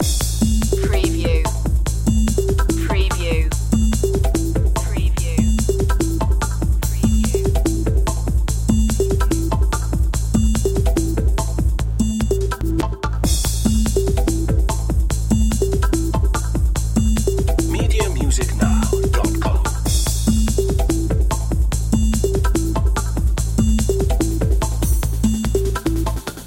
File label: electromanics loop